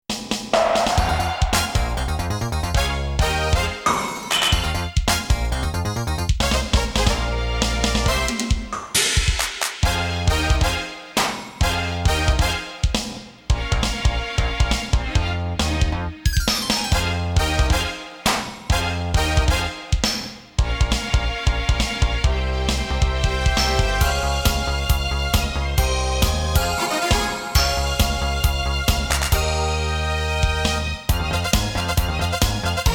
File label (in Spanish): (versión karaoke); Dragon Ball; Dragon Ball Z; Latin American